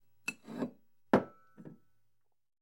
Bottle Up And Down On Table; Grab Bottle From Table With Light Liquid Slosh And Set Down. - Bottle Set Down